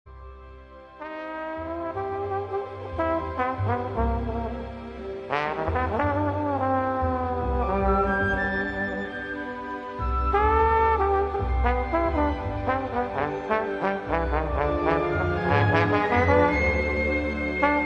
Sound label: New blues evolution orch